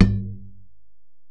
Ac Perc, Drum Machines Alesis DM-PRO

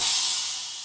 kit, Yamaha Motif-ES8

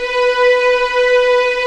B4 EXP MF, VIOLINS; East West; The Ultimate String Collection

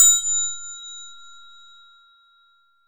F7 filt, temple bells; SoundBytes - Early Patches

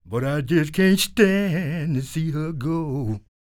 SING, Blues Soul; Spectrasonics Vocal Planet

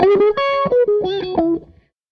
Strat Guitar Loop

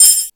Multi FX, Drum Machines, E-MU Pro-Cussion

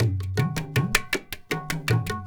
INDIA L, Heart of Asia, IND ENS

